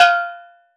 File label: SteelDrums F5; Calypso; Percussion